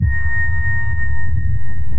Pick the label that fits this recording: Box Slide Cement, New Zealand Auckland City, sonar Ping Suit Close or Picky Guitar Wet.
sonar Ping Suit Close